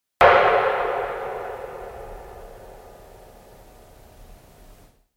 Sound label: Cembalo Body Response